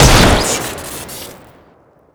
trench launcher shoot crit; tf2classic

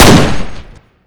derringer shoot; tf2classic

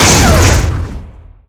tau explosion charge; tf2classic

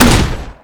conch in a lake shoot, weapons; tf2classic

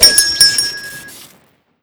twig swing crit; tf2classic

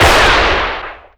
revolver engi shoot; tf2classic

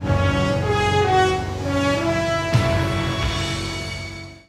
draw; patriot; tf2classic

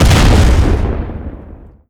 airstrike small explosion; tf2classic

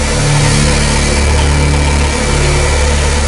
tokamak spin; tf2classic

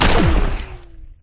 laser blaster shoot; tf2classic

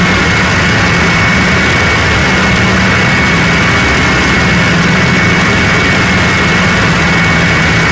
Big Drill Shoot, weapons; tf2classic

